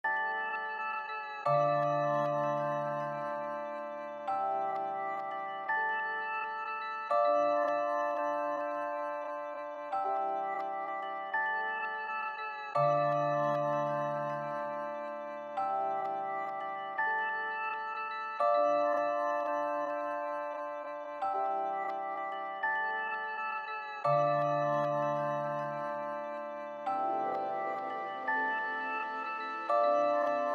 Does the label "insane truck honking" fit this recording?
No